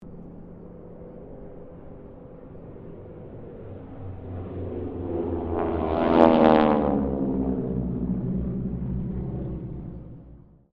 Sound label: Harvard squadron 4 pass, stereo